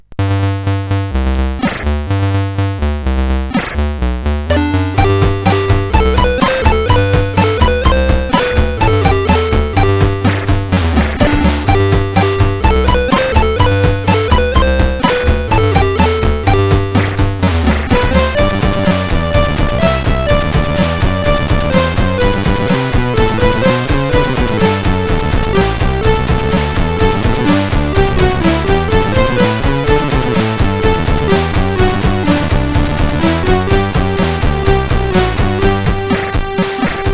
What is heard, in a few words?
Wilhelmus Dutch Hymn, sid